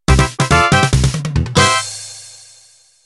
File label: Quebradita Intro